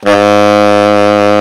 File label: TENOR SAX; Alpine Volksmusik